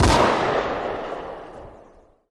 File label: elite distant, weapons; Counter-Strike Global Offensive